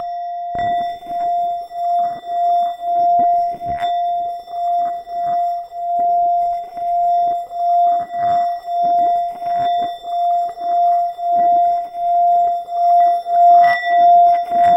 Tibetan Singing Bowls, Small Bowl, Rub; Percussion Samples

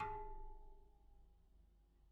Bicycle Spoke

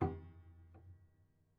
Prepared Piano

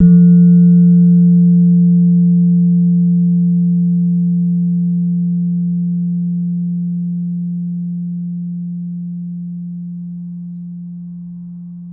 Slenthem, Gamelan

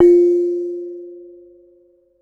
Kenong, Gamelan

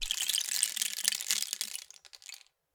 Rain Stick, Percussion Samples

